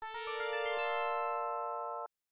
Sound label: Fanfare; Laser Ghost; System 18; arcade